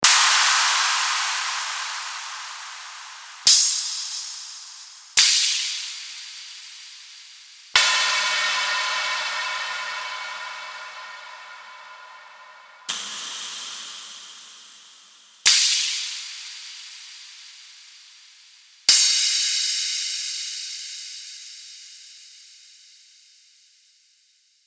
Crashes Splashes; Muestra Samples

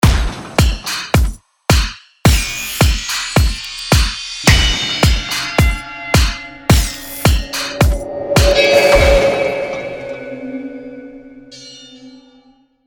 Industrial; Muestra Samples; Starter Pack Vol I

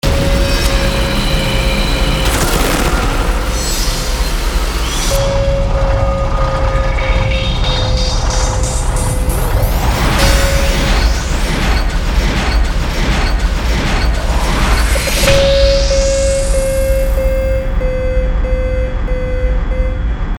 Industrial; Muestra Samples

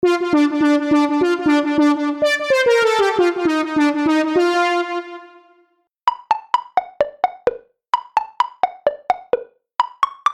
Moog Tables

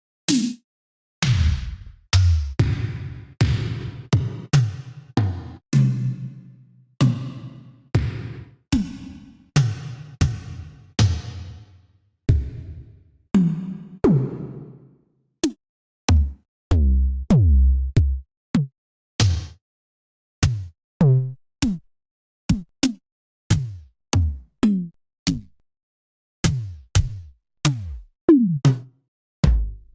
Toms; Chemical

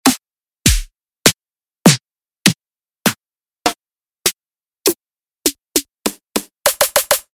Minimal Snares; Muestra Samples

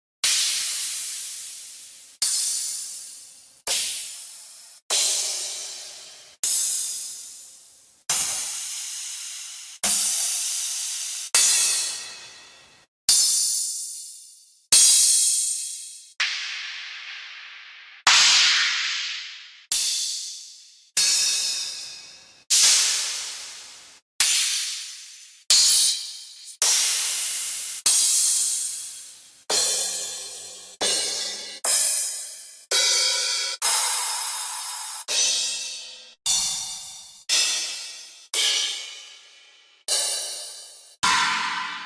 Crashes; Muestras; Chemical

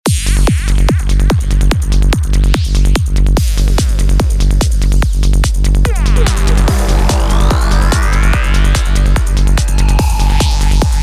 Psytrance; Muestra Samples; Starter Pack

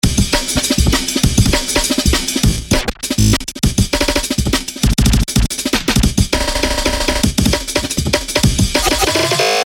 Breakcore; Muestra Samples; Starter Pack

